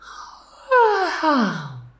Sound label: yawning; rosalina; SM64 CO-OP